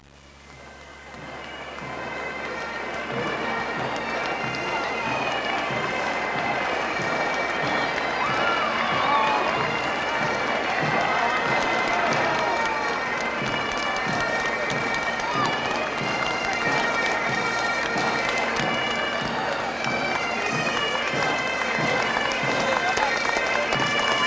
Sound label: bagpipes, parades